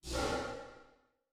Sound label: space dragon pulse; Stellaris; guardians